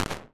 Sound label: Empty Car, Impulse Responses; Small Spaces; Indoor Spaces; Apple